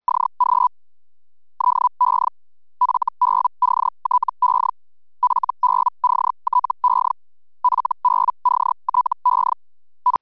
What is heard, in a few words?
FELDHELL